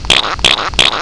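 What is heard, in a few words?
squirt beep; DAVID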